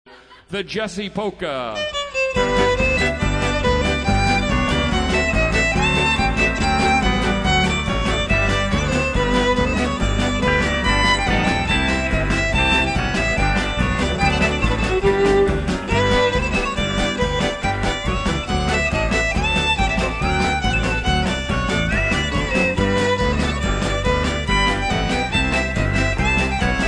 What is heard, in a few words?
Polka